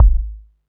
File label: BD SOFT, Techno 138 BPM; Best Service ProSamples vol.54; AKAI